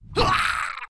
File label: daemon attack; propack